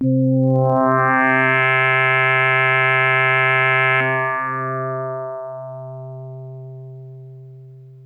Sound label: Synth, Snippy FM leadz